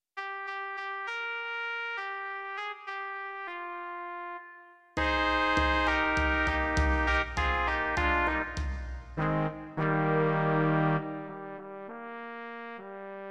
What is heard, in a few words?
African Dawn; Ensemble